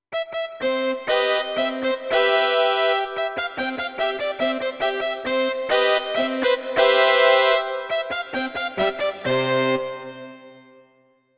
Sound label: Charang Lead